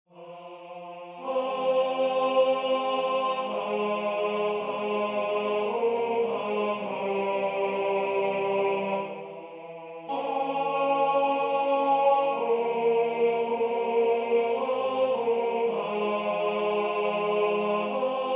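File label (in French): tenor, Musique sacrée; Parce Domine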